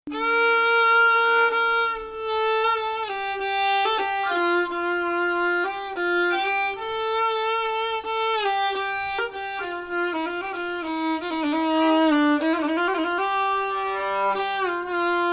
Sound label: Bayati Samai Ajam - Bayati; alternate parse of 08-11: bayati descent from 6